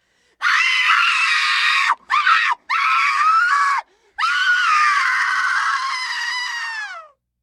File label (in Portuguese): grito horror